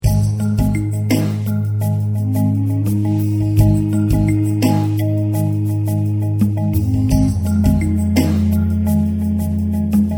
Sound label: marimba